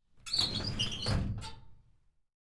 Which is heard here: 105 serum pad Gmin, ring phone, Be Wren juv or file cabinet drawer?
file cabinet drawer